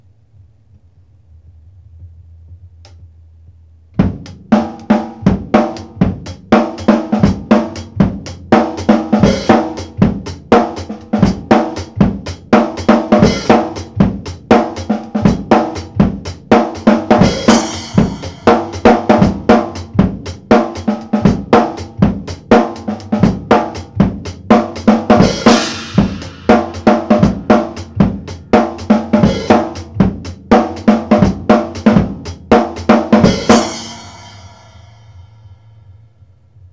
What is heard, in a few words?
beat simple funk 120, acoustic